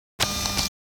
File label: Camera movement lens focus zoom